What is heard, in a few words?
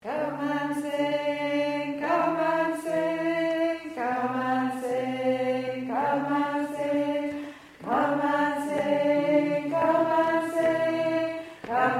Come And Sing Alto